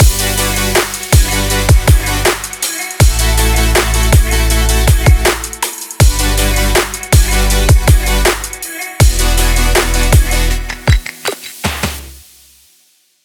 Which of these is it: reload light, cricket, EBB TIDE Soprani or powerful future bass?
powerful future bass